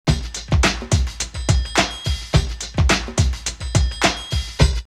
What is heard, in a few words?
soulful world 106, drum loops, old school; X-Static Goldmine